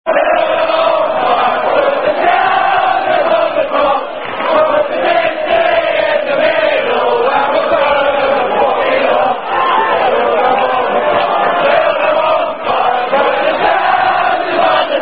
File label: Manchester United Chant Build A Bonfire, Tune Clementine